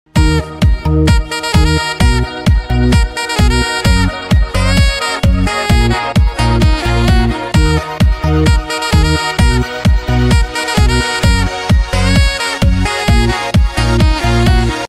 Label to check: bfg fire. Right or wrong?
Wrong